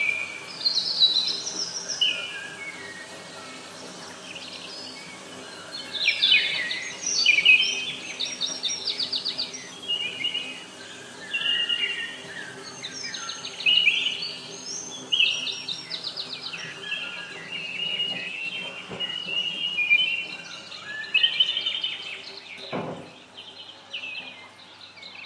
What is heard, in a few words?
toilet at this petrol station accompanied by piped bird song